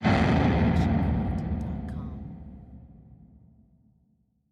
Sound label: Eerie Distorted Rumble Hit; SoundsCrate